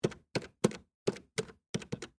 Telephone Push, Technology, Telephone, Push, Button, Dial, Number; Telephones; BlastWave